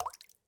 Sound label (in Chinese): 滴水石锥水滴入炼药锅声